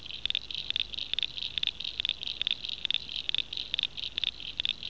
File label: frog agalychnis callidryas